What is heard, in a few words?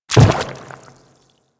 Quadruped Medium Land Water, Footsteps; Warframe; Infested